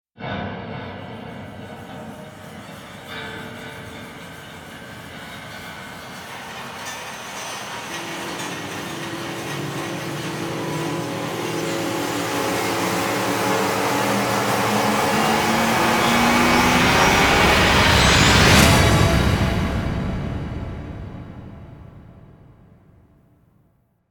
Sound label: rise